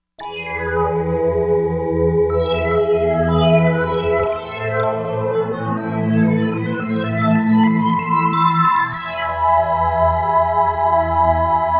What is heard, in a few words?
Star Child, Korg MSC-07S Synth; Korg MSC-07S Synth 2